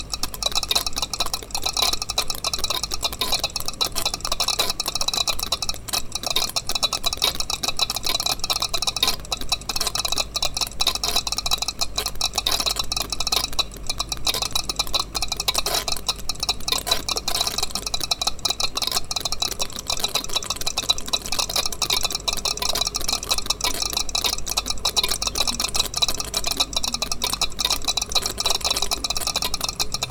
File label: strange air noise